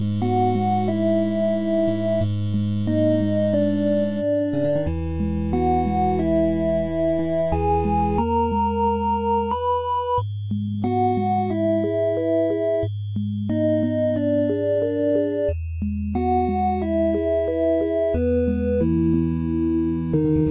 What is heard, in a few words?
music box